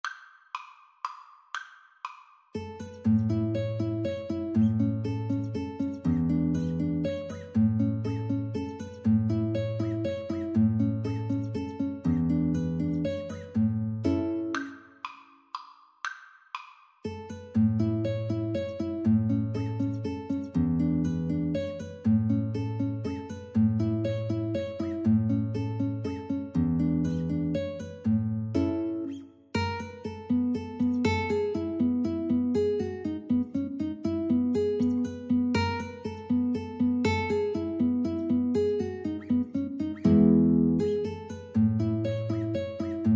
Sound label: Guitar Duet; joseph kuffner op168